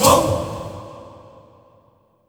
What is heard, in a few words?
ISAIA HIT1-R; Spectrasonics; Heart of Africa 2